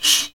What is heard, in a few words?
ETHNIC PERC; Roland LA